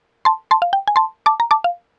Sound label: future shutdown, computer sounds